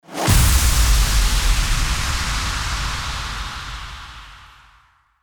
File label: IMPACT